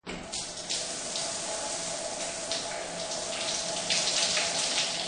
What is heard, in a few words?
water sound